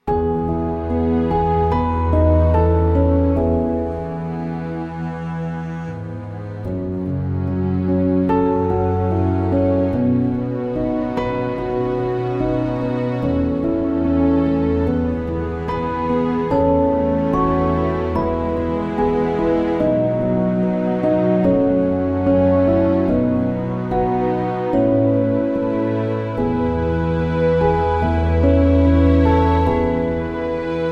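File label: E orchestral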